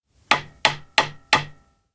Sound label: ranch repair; anemoi